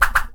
Swishing

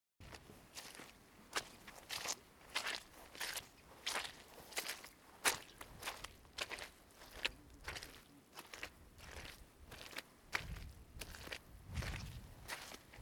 Muddy Footsteps

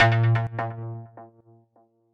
Charged Synth Stab

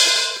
Hat open

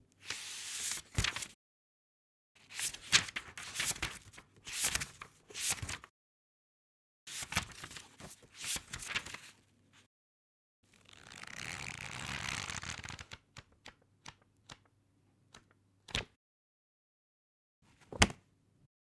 Book Pages Variations

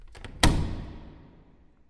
Hall door

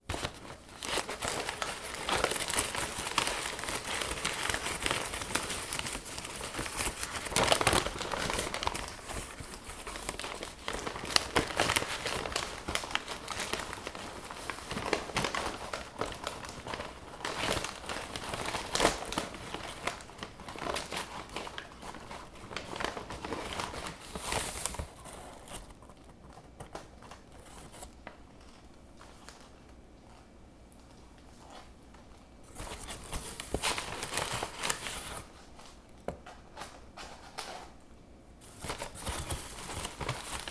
Getting Burger And Various Other Items

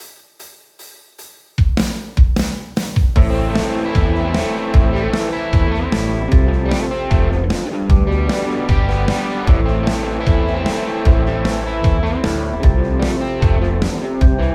Loopable Country Style Music

